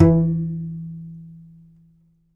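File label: contrabass balalaika, Plucked Strings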